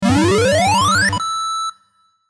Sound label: Ringometer SFX